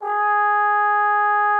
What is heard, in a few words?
trombone G#4; Xsample VOL-3